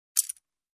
Poker Chips

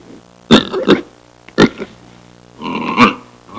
Pig curiously snorting

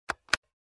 Keyboard button press slowly

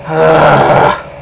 Bear growl

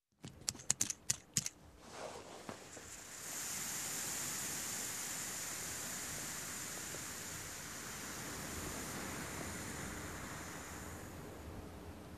Blood Pressure Cuff air release

